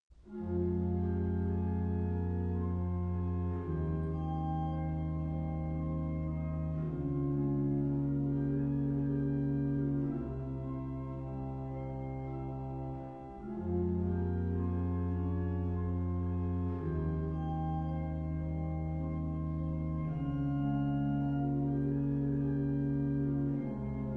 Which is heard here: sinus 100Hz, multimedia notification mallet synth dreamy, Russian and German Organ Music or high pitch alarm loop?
Russian and German Organ Music